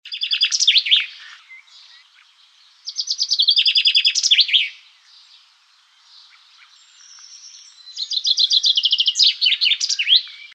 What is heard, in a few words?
Chaffinch, Fringilla coelebs